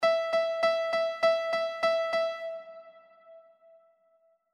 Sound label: Simple Meter Simple Quadruple example